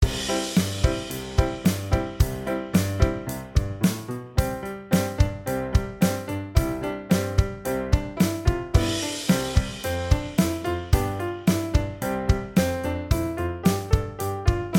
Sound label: backing track